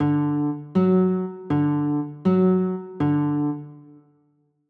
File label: d maj, chords